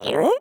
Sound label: mannequin grunt